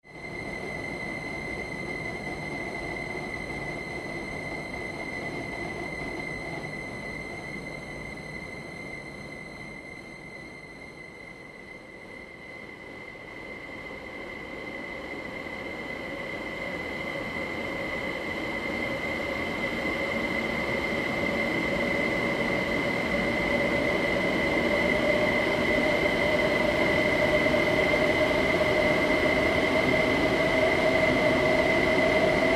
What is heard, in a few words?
grain frozen train howl